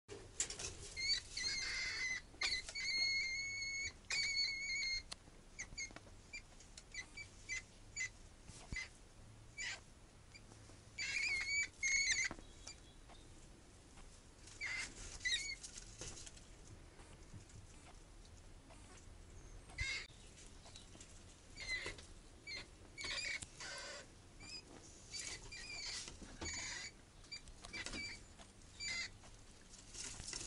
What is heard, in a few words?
MOUSE SOUND